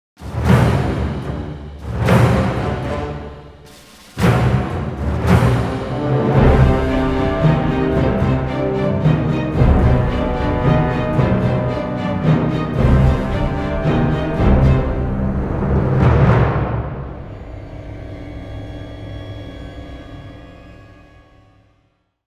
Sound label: Soft Action